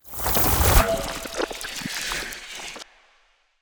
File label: Ice Spell Utility Unlocking; Utility Spells; Arctic Aura